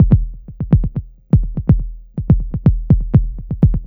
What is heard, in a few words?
Click Trick, Modular Electronica; Beats; Sonic Foundry (Sony Creative Software)